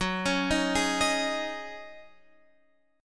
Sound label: C sharp Suspended 2nd Suspended 4th, F sharp